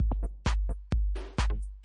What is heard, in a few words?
matching loop; fishingduel